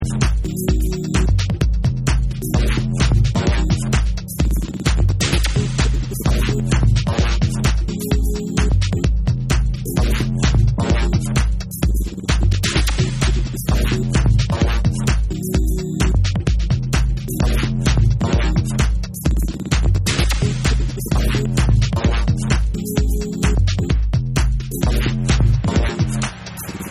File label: Electro